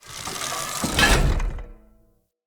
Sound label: Pollution Tower Face Open; Encounters